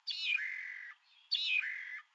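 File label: MAGNIROSTRIS, Darwin's Finches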